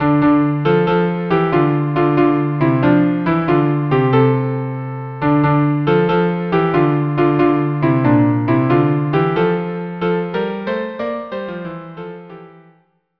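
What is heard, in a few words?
wassail violin cello; Yorkshire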